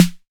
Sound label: 808 SD Tape, Snare Drum; Goldbaby Tape808 & Tape909